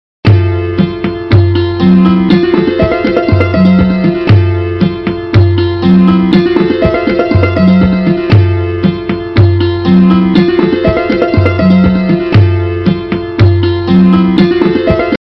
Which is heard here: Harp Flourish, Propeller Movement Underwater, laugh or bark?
Harp Flourish